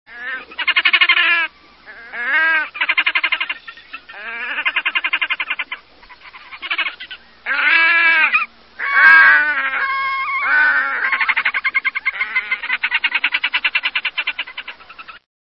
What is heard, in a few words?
Red necked Grebe